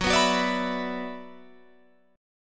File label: F sharp 7th Suspended 4th Sharp 5th, Guitar, Open F; F#7sus4#5 strummed